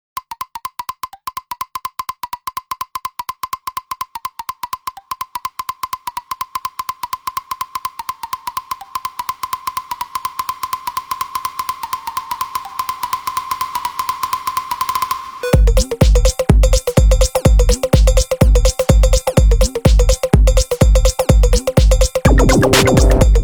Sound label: Digital thump, phonetones Nokia N8